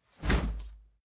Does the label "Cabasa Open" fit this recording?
No